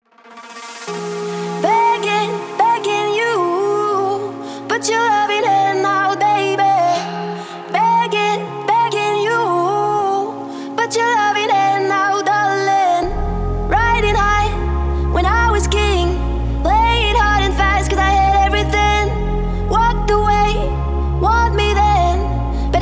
hypertechno

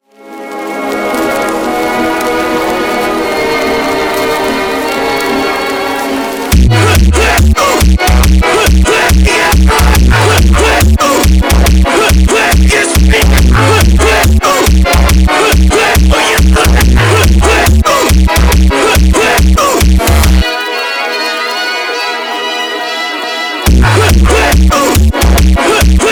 funk

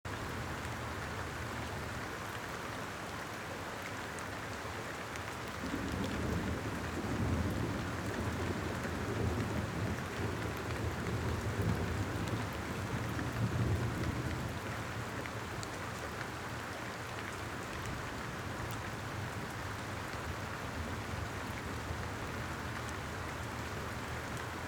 thunderstorm

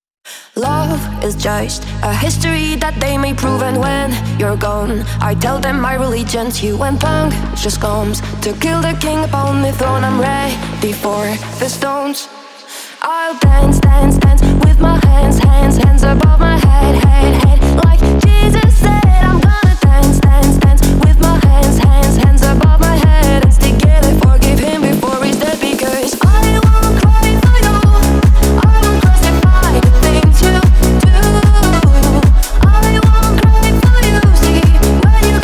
hypertechno

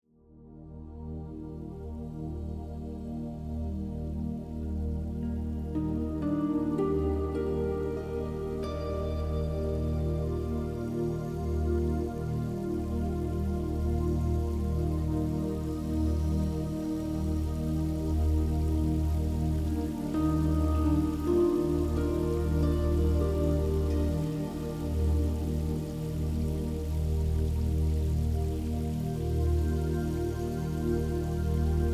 serene soundscapes spa

